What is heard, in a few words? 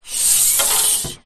metal pole slide; foley